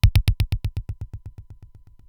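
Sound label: Simmons SDS-9 bass drum, Drum Machines; Factory D3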